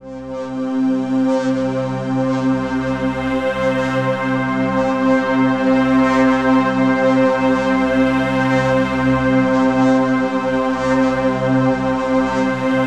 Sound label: Atmosphere